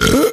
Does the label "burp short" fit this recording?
Yes